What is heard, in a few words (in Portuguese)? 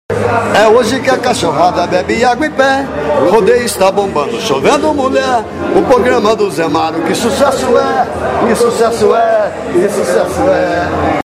VINHETA CANTADA